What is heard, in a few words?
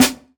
Snare, jungle breaks drum sounds